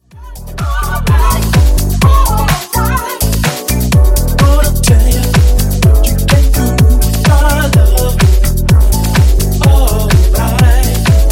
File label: Funk Nu Disco House, 124 Bpm